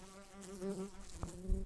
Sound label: Humilis workers, bumblebees